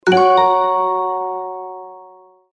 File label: Afterthought Message